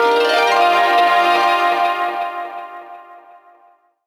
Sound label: HRP TOUCH FX, Gbm; Rawcutz - Nu Forms